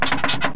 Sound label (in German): kaputt; DEMOUK